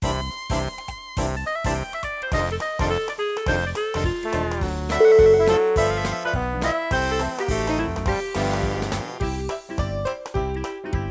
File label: jingle bell bricks